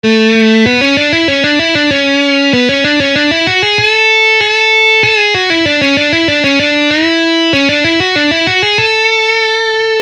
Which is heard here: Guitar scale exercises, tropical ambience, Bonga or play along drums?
Guitar scale exercises